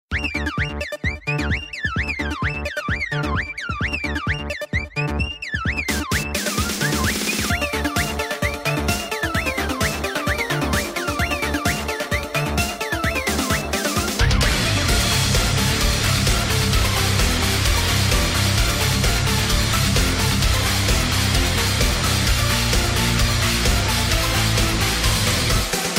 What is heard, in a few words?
Error